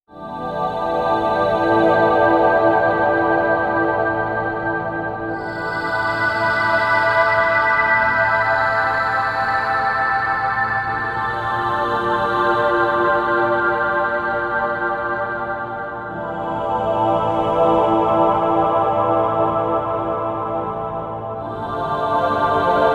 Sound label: analog chorus eq chords